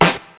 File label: nice snare drum sample f key, acoustic snare sound tuned to the F note